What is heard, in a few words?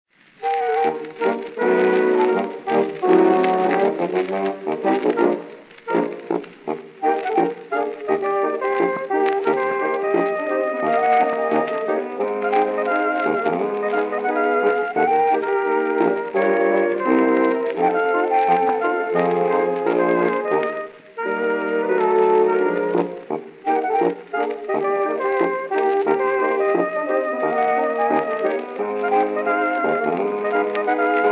Saxophone Sextette; Written by Tom Brown and Guy Shrigley; A 1916 take